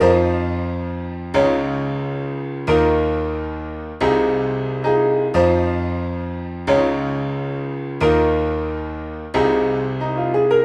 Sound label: Sample Melody